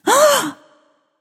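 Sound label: nonverbal breath; smite; voicepack; izanami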